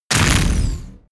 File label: sci-fi plasma cannon fire